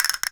CASTANET; ILIO; Synclavier Percussion 2; World & Orchestral

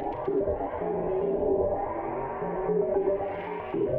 Tonal Re Draw, sparse soundscape

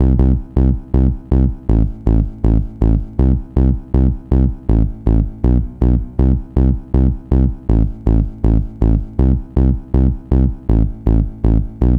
gro 160, Hardcore Loops, Bass Loops; Dance Synths

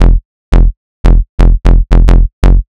BASS; neuro; Stanza

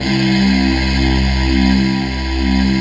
GIRL SH, FAIRLY VOX; Synth

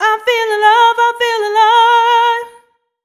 f mel e flat, fem Vocals

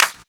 Clap; Equipped Music; Loungin House

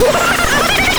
VOX LAUGH; NES DPCM; MIDINES

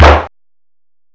SNARE, DRUMS

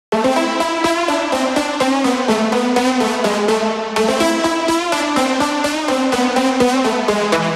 Brass Loop - 125BPM - Amin; 99Sounds x Monosounds; Instrument Loops

